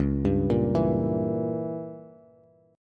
Db Diminished 7th Db down Bass; arpeggiated; JGuitar

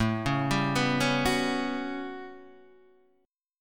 A 13th, Guitar Standard, chord; arpeggiated

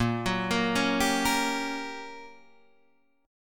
G Minor Major 7th Flat 5th Bb

